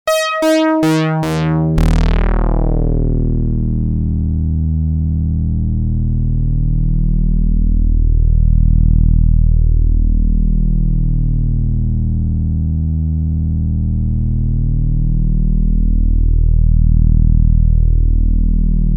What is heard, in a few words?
polaris dry osc